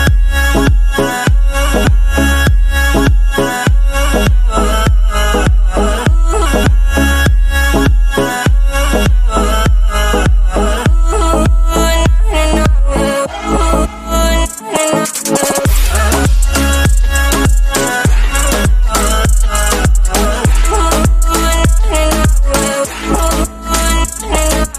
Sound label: english romantic ringtone